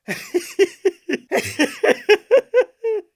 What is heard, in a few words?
Man Laugh Strong